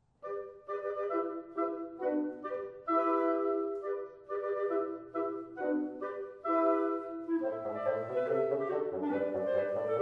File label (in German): Marsch, concertina